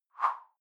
whoosh simple slash big high

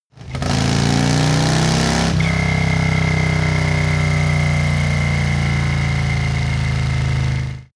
motorcycle harley accelerate decelerate whistle

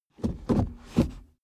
suv change reverse gear slow; verkehr transport; autos

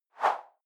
whoosh simple short airy high

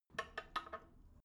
drain valve lever handle soft, badezimmer; haus garten

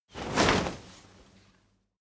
crowd movement single hard; bewegung; soundpool

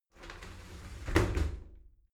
shower slide doors close soft; objekte; tueren

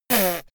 little girl snort; soundpool

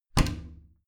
bathroom cabinet warped close heavy

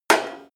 impact sheet metal small short; soundpool

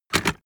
metal buckle handling

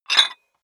dumbbell pull up rattle, fitnessstudio

